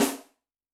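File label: Electric RIM, Yamaha DTXpress-II snare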